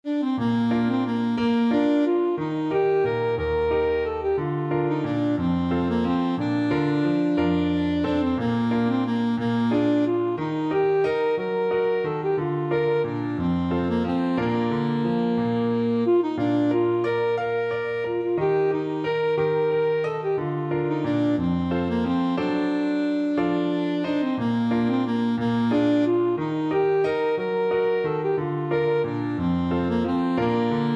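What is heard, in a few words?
endearing alto saxophone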